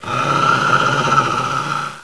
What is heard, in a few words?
gonome idle; svencoop